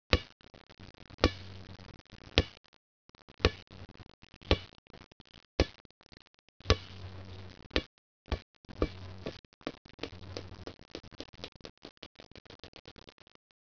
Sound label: boing